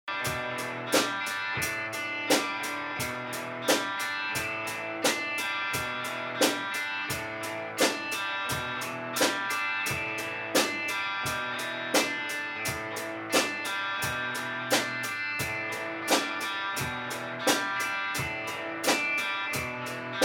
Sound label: Tick Tock, jam